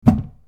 attack 打撃

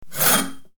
attack 抜刀

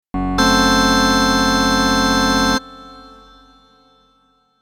恐怖 ディダーン

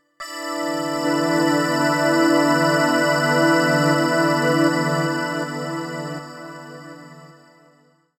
kaifuku ｷｨｰﾝ

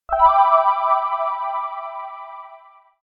kaifuku ﾌｩﾙﾌｧｰﾝ

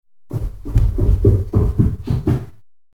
ashioto

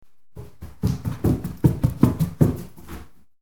ashioto

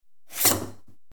attack 刀

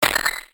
attack 打撃

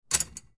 kagi

